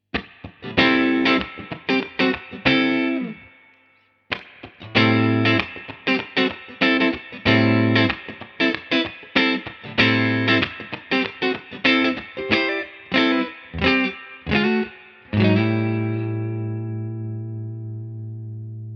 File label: Tone Tweaker funky presence; Carl Martin